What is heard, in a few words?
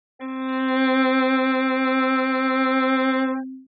A violin tone at approximately 260 Hz; analyzed with Noise Floor -90 dB and Peak Amplitude Range 12 dB